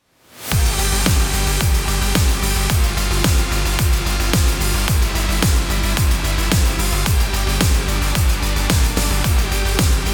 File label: stock music